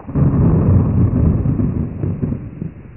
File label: thunder Rumble; LightningBreak